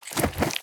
Big Monster Attack

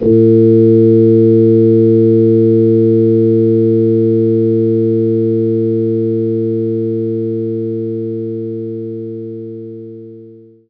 Hammond Organ; knights try

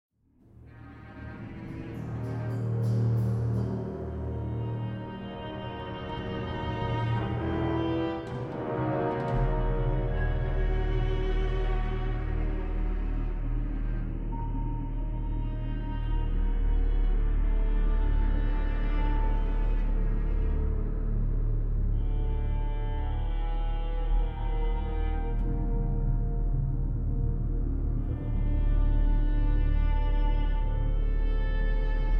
Music; Sacrifice

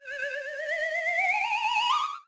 top start; lego my style preschool

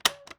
Click

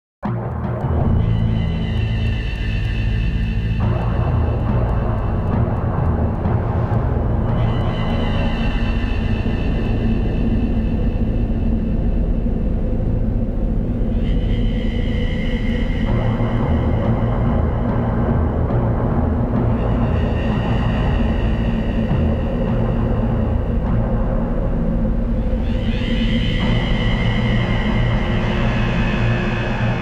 Crows & Drums; Dark Sun Wake of the Ravager; ms dos

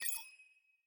UI Hint; A Year of Rain

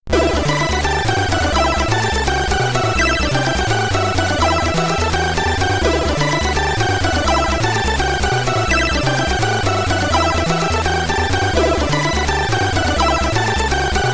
Mothership, SID Stereo; Commodore 64

